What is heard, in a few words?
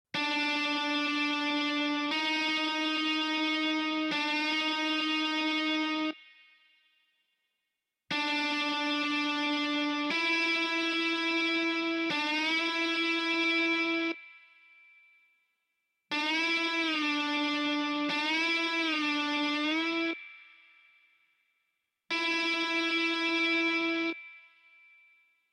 Bendings; solo techniken